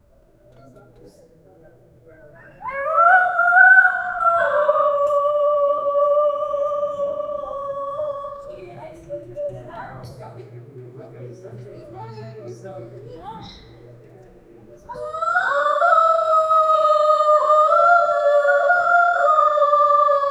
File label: 58 year old african, funeral